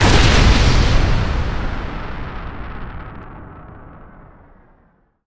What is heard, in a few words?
Eye Break